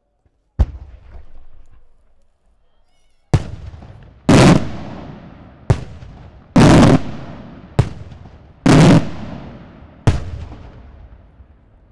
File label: Very strong blast, crackles, sparkle, Fireworks Malta; Sound Villain